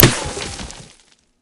dirt, bullet collide

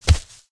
hoof ground, step

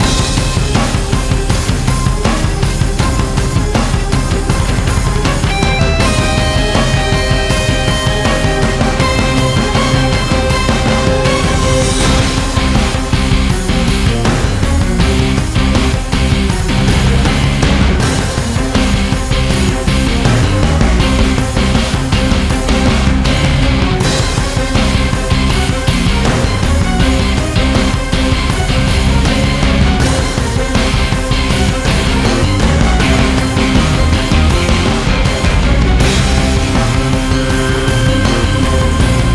theme, music combat

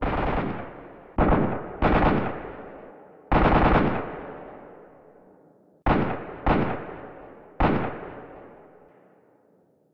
gunfire, ambient

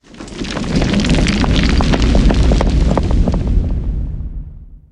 rock, ambient outdoor; rock2

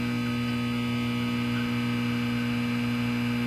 daylight lamp

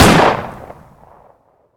usp shot

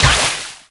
water, bullet collide

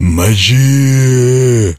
zombie attack; monsters

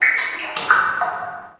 drop, underground; ambient

